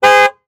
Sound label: Auto Police Car Honk Horn Medium; Transportation